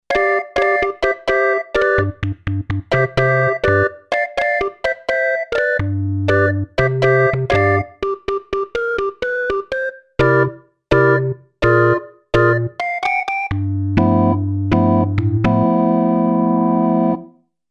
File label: Tonewheel